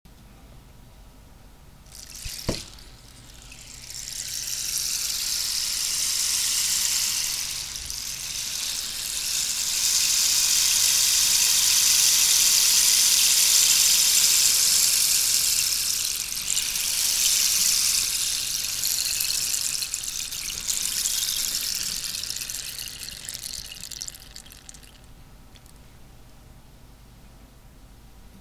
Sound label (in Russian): Bambukovyy posoh dozhd